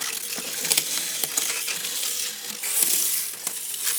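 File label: Slide Loop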